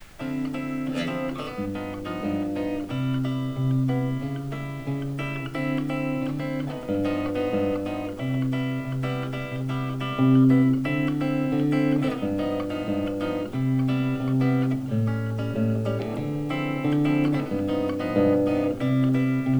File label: fir bass boost